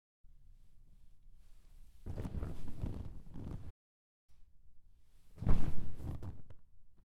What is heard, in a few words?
Sitting Falling On Couch